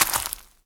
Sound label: Leaf, Footsteps; zps; Foley